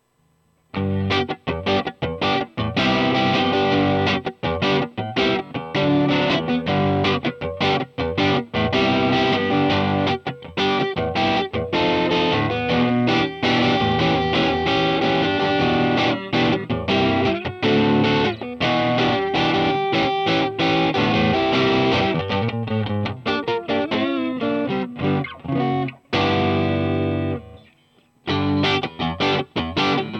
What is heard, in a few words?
bulldog drive